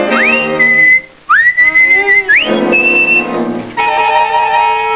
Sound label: whistle; Tom en Jerry